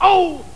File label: VIPER